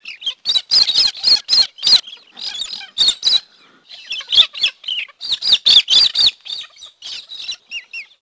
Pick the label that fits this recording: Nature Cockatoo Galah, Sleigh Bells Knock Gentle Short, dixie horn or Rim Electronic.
Nature Cockatoo Galah